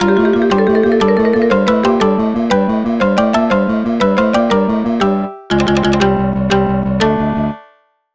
Game Start

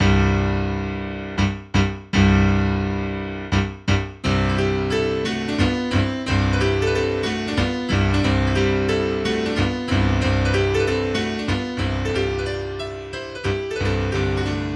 Mazurka No 34 in C Major Vivace

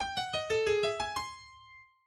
Secret Discovery Sound

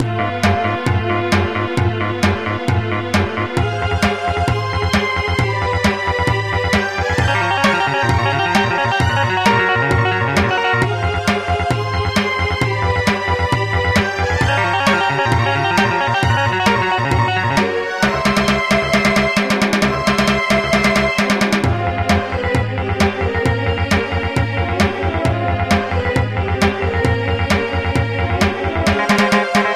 Battle Music

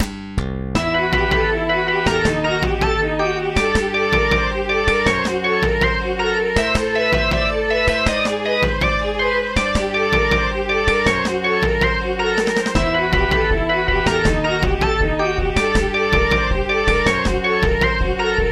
midi; torch man

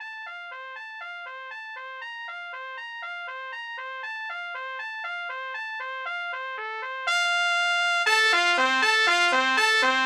Duet with Three Trumpets

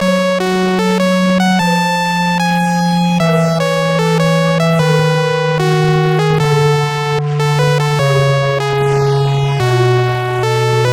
Sound Test

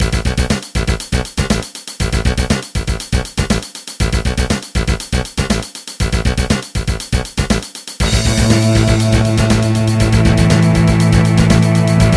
Bass

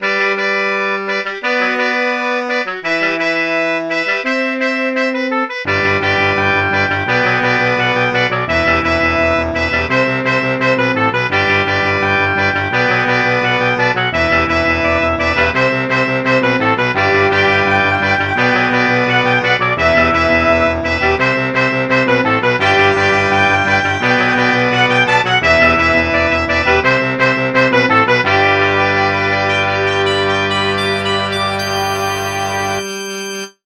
Sax; The Office

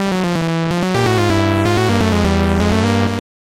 Game Over

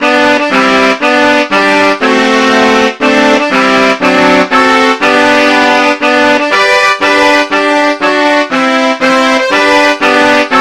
Happy Birthday Song

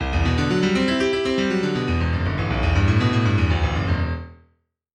arpeggio

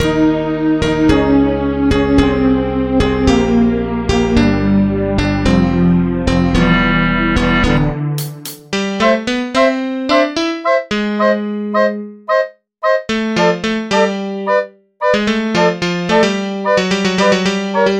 No percussion; Thomas the Tank Engine

